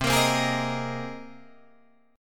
C Minor 7th Sharp 5th C Guitar Drop D

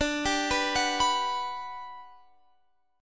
G Major 7th D

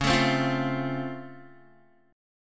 Db Augmented Major 7th, down, Guitar; strummed; JGuitar

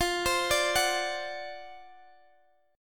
D 7th F, guitar; D7/F; arpeggiated

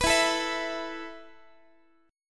E Suspended 4th E, down, Guitar; Esus4; strummed; x,x,14,14,13,15

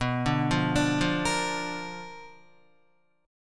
B Minor Major 7th Flat 5th Guitar; BmM7b5 arpeggiated; JGuitar

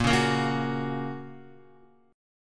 A sharp Minor Sharp 5th A sharp down Guitar; strummed; JGuitar

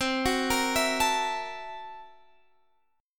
Gb 7th Sharp 9th Flat 5th C, guitar

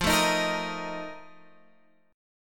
F 11th F, chord; F11 Chord {13 12 13 X 11 11} strummed

